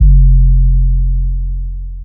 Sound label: trap bass; 7rystan Trap Bass